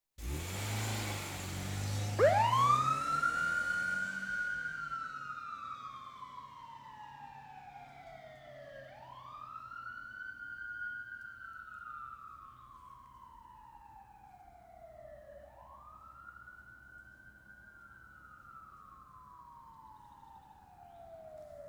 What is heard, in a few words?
Police Car Departs With Wail Sire; Emergency; BBC Sound Effects Library